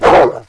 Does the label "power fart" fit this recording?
No